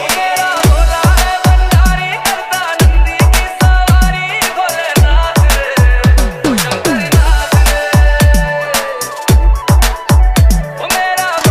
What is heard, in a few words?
Dj Remix Ringtone